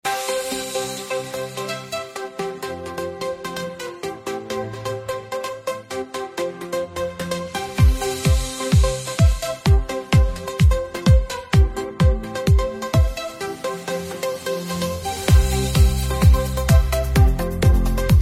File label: jingle